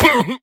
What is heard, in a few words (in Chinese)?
卫道士死亡声